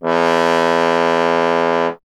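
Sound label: bass trombone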